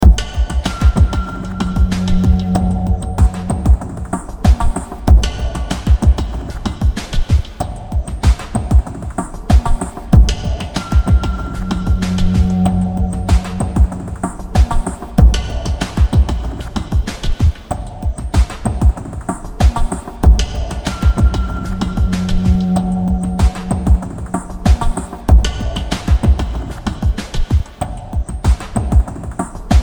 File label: Plunderer short